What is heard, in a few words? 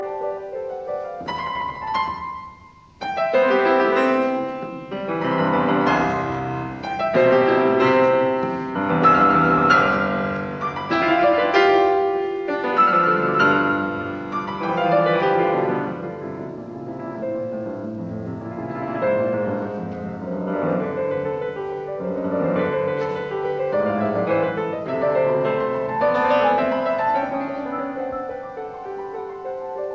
Variations in C major on a Waltz; 33 Variations; Op 120; Variation 6; Allegro